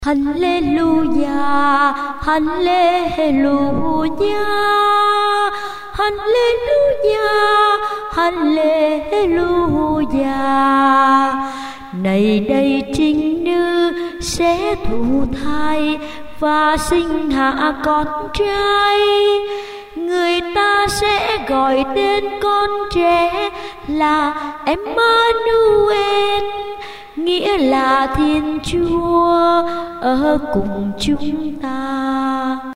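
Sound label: soprano tenor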